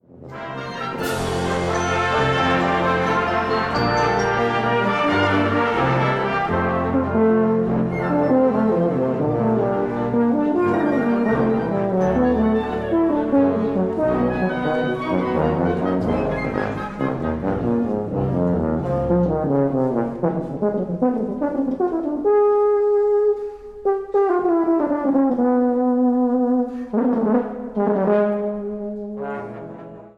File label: tuba concerto, sinfonisches Blasorchester